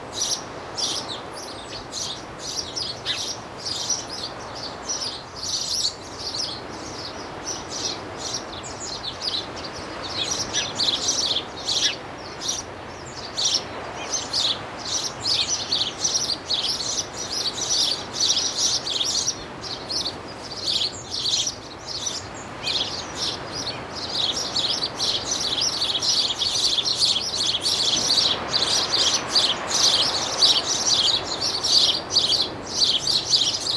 Animals Birds in the Seaside Garden Loop; افکت صدا حیوانات

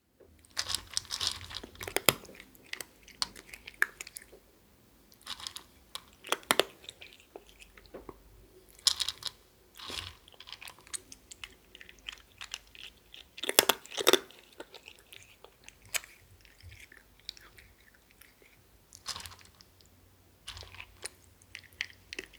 Cat Eating Dry Food; افکت صدا حیوانات; Animals

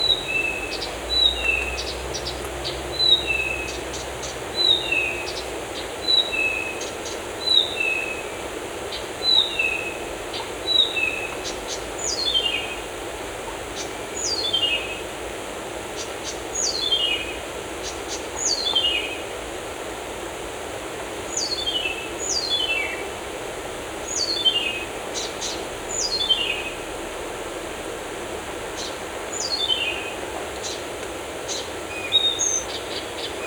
Birds in Forest; Loop; افکت صدا حیوانات; Animals

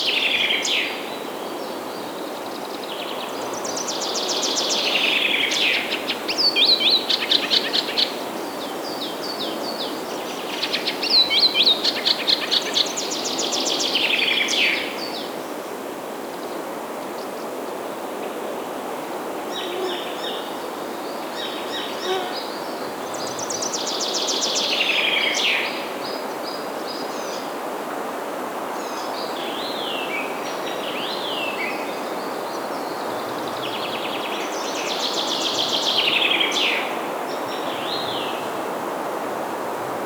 Forest Birds Sounds; Loop; افکت صدا حیوانات; Animals

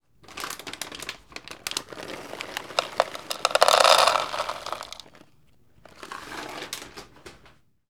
Pouring Dry Cat Food; افکت صدا حیوانات; Animals

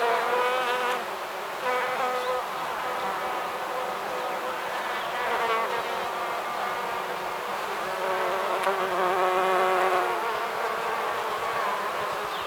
Bees; Loop; افکت صدا حیوانات; Animals

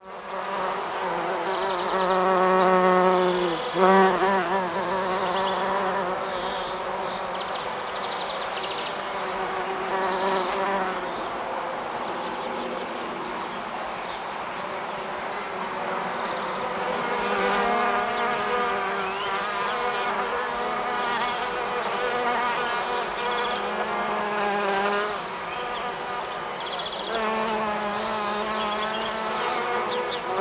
Bees; افکت صدا حیوانات; Animals

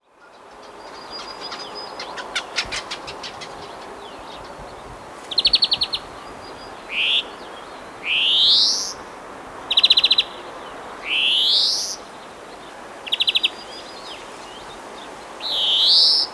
Singing Birds Early Morning; افکت صدا حیوانات; Animals

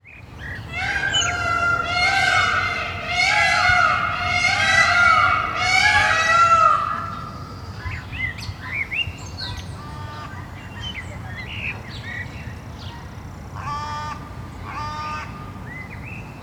Peacocks in Bird Park; افکت صدا حیوانات; Animals

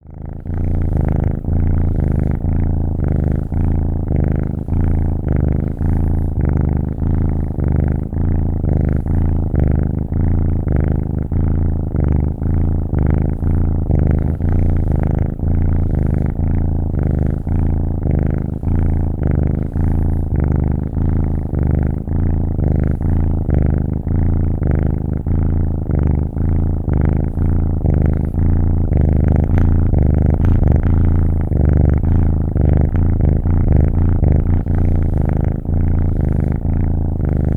Kitten Purring; افکت صدا حیوانات; Animals

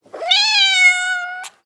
Kitten Meow; افکت صدا حیوانات; Animals

